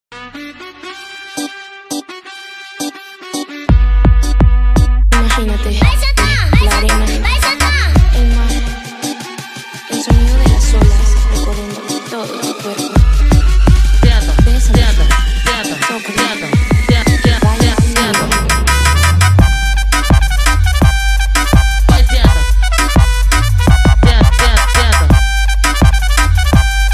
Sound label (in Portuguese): som automotivo especial reggae e bregadeira